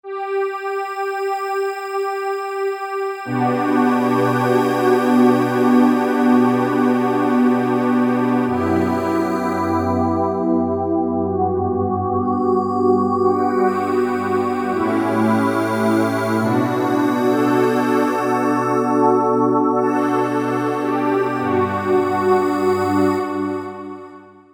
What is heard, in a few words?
Dreamy Pad